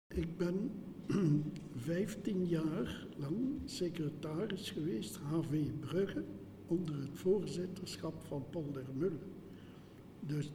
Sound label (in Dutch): bal der vrijzinnigheid; Brugge 2014